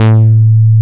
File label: DIGITAL BASS, BassGuitar; AKAI S6000 CD-ROM - Volume 1